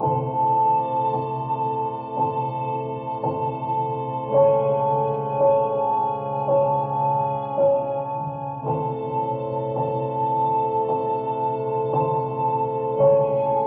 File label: piano and strings, phonky loops